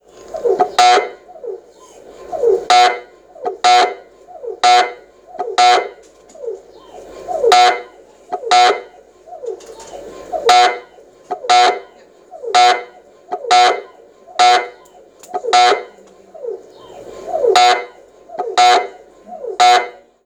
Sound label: MRI scan